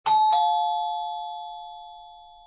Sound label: doorbell